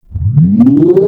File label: Clan Dojo Elevator Start; Doors